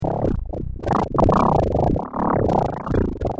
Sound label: wobble